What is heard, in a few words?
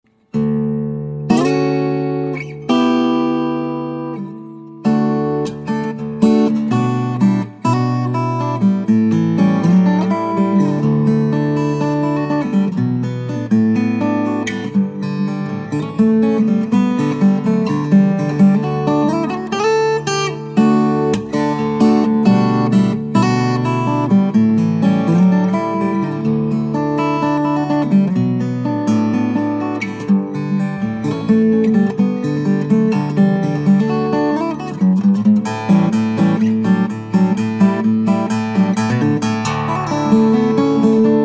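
Guitar Sample 15b, Rosewoods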